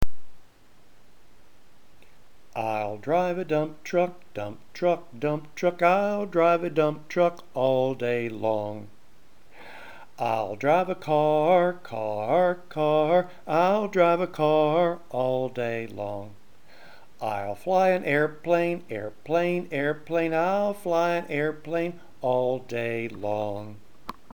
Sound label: I'll drive a dump truck